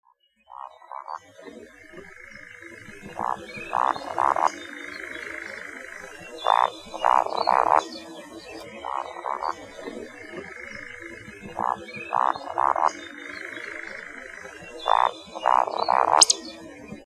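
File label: woodcock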